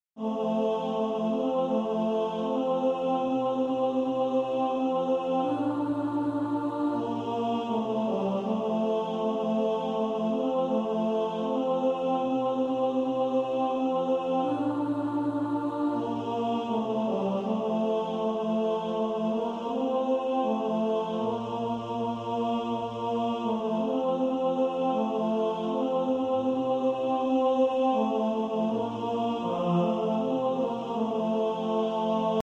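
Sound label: tenor, practice music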